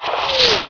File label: whizz